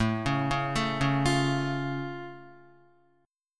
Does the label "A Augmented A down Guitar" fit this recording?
Yes